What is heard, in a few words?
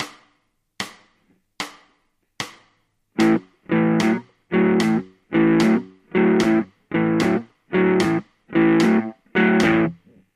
blues, ritmos de blues